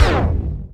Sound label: CRCK, Production Effects; XXL 800 Production Effects